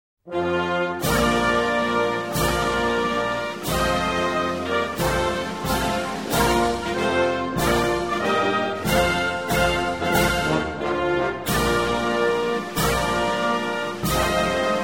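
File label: anthem, Nepal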